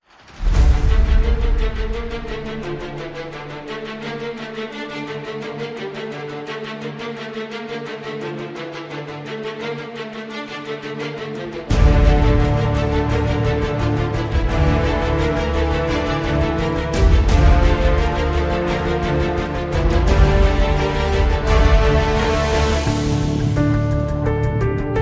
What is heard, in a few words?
No Choir